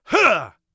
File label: attack, Warrior Voice; Warrior Voice Pack